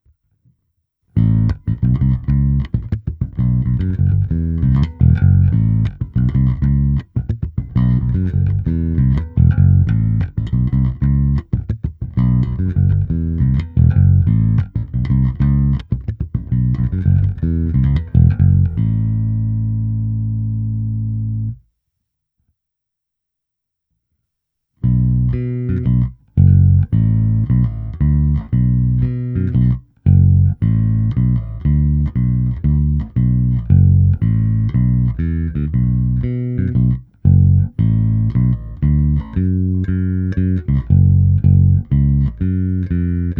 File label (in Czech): Nahrávka se simulací aparátu prostřednictvím multiefektu Zoom MS-60B, baskytara; Harley Benton MV-4MSB Gotoh BM